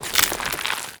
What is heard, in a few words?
zombie move, foley; creatures; Half Life Model Pack